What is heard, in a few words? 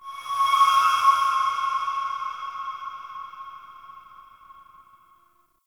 Day Screechy Creature; Ambience; One Offs; Glades Of Andurin